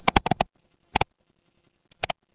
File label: office mouse click